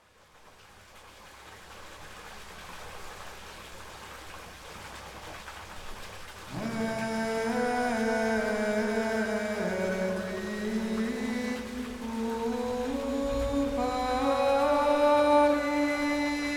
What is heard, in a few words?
ghmerti solo